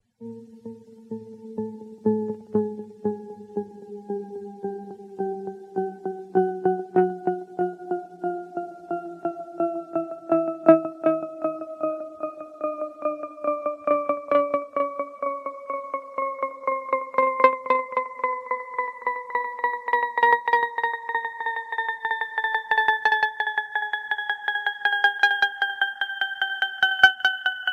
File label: falling